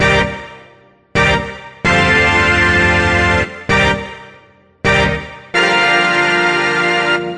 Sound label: grand brass; SAMPLED MUSIC LOOPS